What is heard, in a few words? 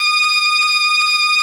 organ F, PIPE ORGAN; Best Service ProSamples vol.55 - Retro Sampler; AKAI